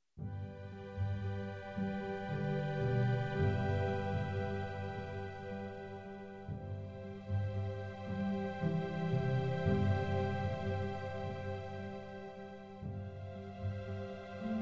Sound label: melody groups violas winds backing wet